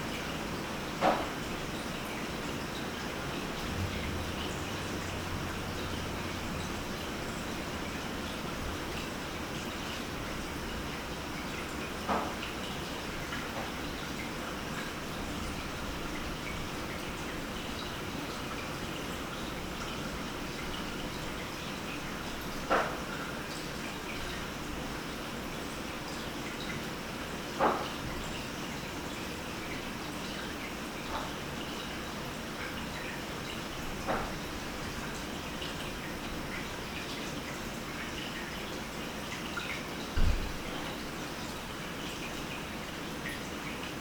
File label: Several unexplained knocks; Case 032 Wampler Home